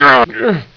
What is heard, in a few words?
pain; wanted